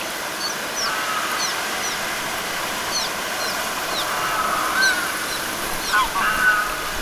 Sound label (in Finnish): tormapaaskysen huomioaantelya; Siellä uudessa törmäpääskysyhdyskunnassa